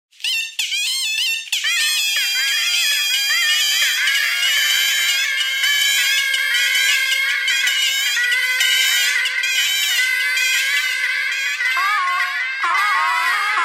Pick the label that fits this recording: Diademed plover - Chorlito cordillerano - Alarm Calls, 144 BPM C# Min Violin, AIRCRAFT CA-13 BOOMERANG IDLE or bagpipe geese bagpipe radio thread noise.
bagpipe geese bagpipe radio thread noise